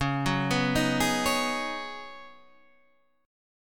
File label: C sharp 7th Suspended 2nd Suspended 4th, C sharp, down, Guitar, Open D